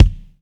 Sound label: MIX BD, AKAI S6000, DRUM RYTHM